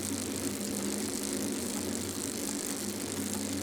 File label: BIKE LOW; SIMUZENSOUND